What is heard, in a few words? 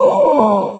die, mp actor